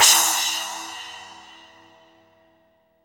SPLASH, Drum; East Collexion; Drum 1 Dry

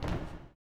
STOMPER; Spectrasonics Vocal Planet

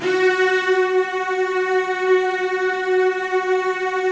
Virtuoso Strings, F#3; ILIO; Kirk Hunter Virtuoso Strings VOL-2; 90 s Sample CDs

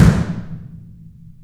CM K AMB, DRUM; AKAI S-Series CD-ROM Sound Library VOL-2

